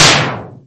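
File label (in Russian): обыкновенный звук выстрела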